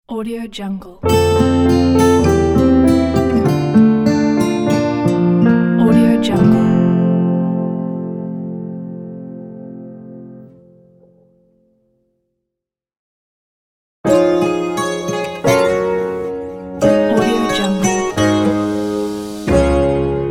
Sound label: summer acoustic logo pack